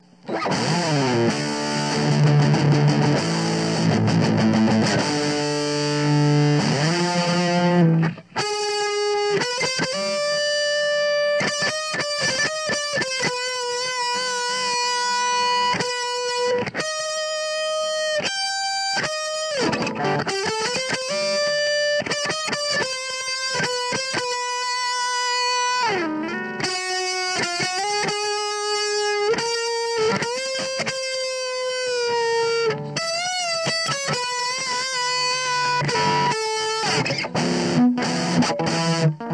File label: fuzz